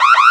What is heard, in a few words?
Alarm